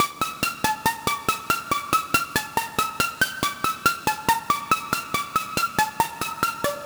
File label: Arp Loop, 140 BPM D Min; Dubstep; Arp Loops; Synths; Cymatics; Dubstep Starter Pack